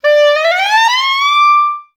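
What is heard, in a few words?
glissando D5; Best Service; Peter Siedlaczek's Advanced Orchestra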